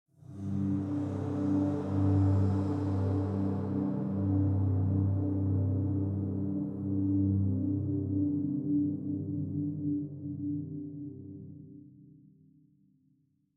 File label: Tunnel Metal; Tunnel Nuendo Metal Conv; inside